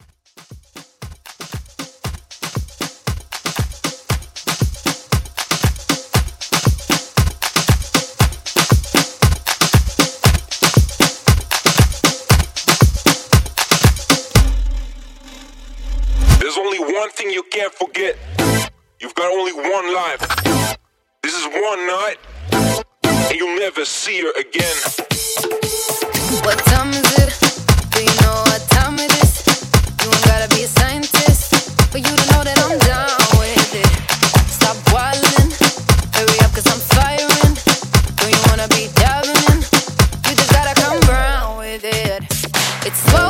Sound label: Afro ReDrum 117Bpm